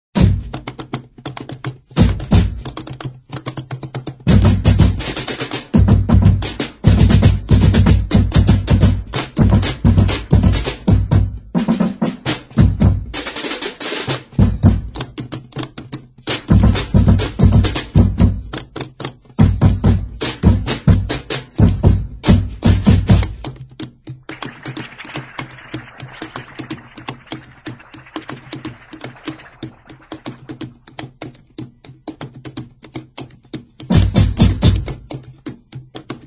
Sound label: (instrumental), Samba